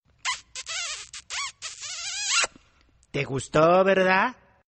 Kiss

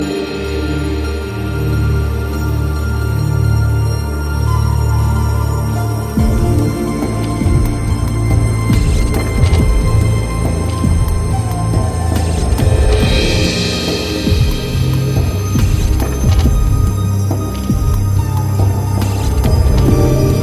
Calm Ring

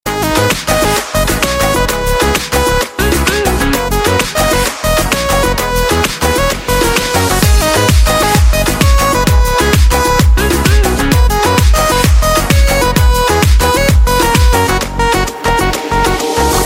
Remix Tone

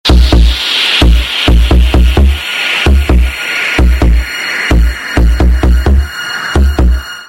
Msg Tone

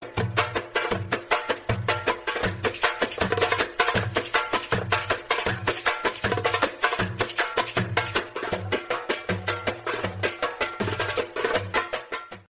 Orient Darbuka Mix

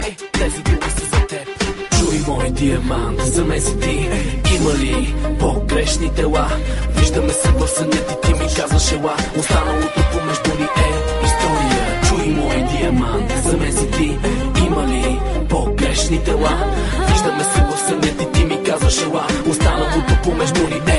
Chalga

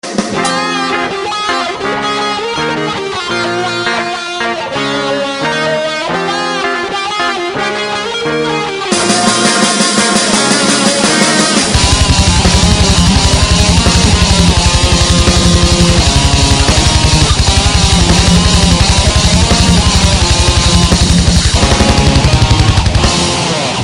Metallic

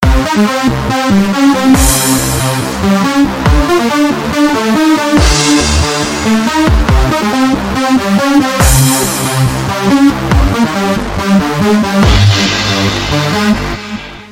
Techno Ringing

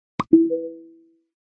Pop Ding; WorkPlace

